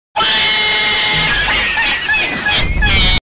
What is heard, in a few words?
cat laughing then explode